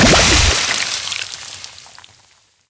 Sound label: poison projectile; player, damage